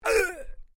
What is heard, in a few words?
MALE VOCALIZATION UGH; Exclamations; Voice